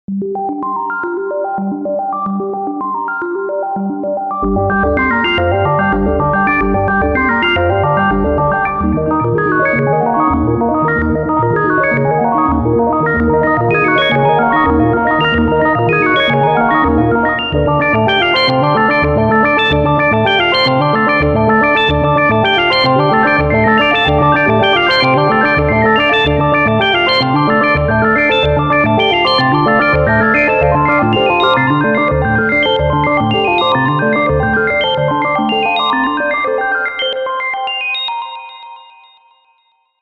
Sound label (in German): Sequenzer